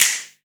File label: Claps & Snares